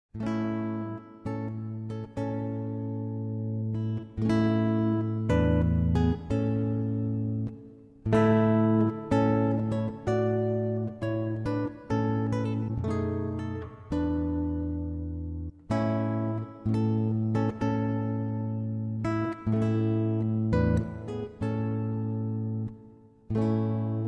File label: bridal chorus